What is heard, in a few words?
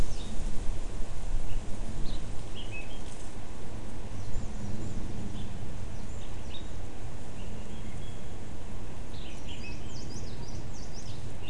mainly bird cluster